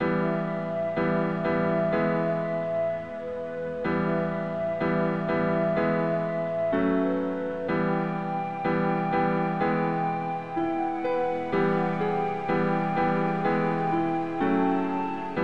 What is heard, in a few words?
Beats